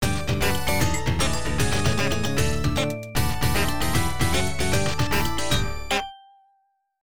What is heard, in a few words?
Game Over Music; Ordyne; Namco System 2; Arcade